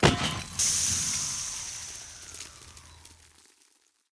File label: alarm activation